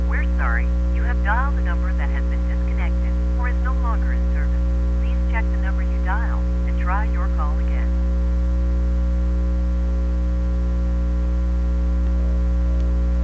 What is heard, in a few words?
disconnected, payphone